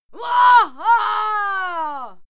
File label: female cheer wuaha; schreie; menschen